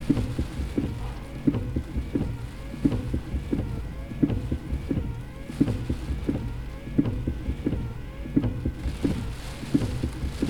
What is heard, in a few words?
Windshield Wipers With Rain In Gusts In Wind